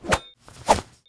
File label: Counter Attack; Opsive; UltimateCharacterController